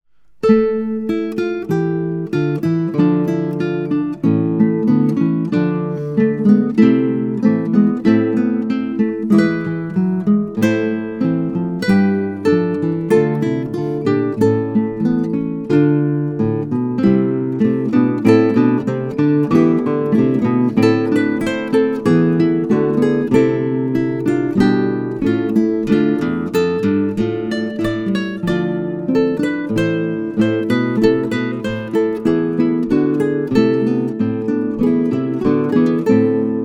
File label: Fuga